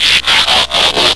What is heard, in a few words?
transform out